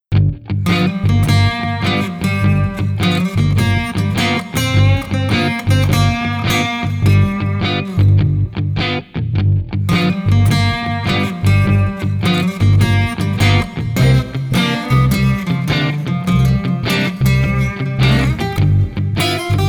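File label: Street Guitar Solid Blues no Drums, 104 bpm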